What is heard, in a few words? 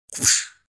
hitstun big